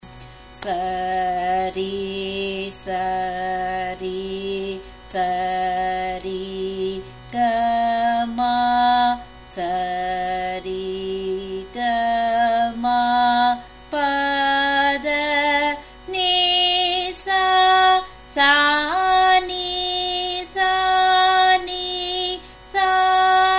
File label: Sarali Varise, F sharp